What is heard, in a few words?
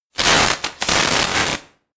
sparks synth dense